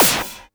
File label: Snares & Claps; Vengeance Samples